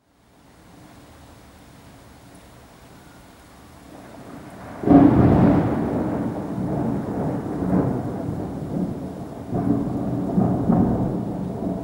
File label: Thunderclap